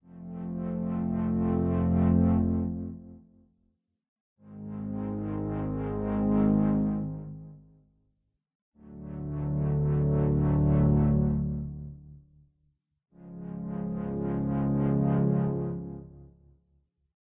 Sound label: walking; Monster Breeder OST